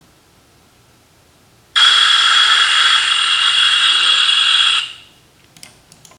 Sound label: Gas Leak